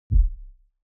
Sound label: abstract hit sub bass soft